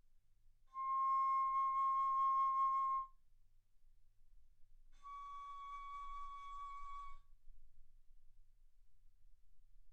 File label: Bass Flute ff C6 Db6